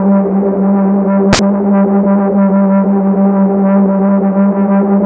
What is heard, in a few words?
drone; Spectrasonic Distorted Reality 1